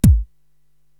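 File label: bass drum Dance, Korg TR-Rack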